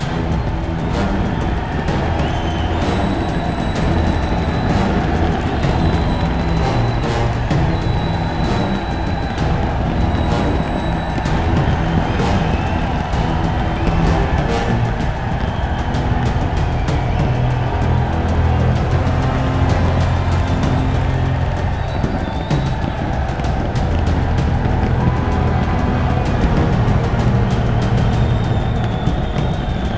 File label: Jingle Gameover Loop; World of Warriors; PS4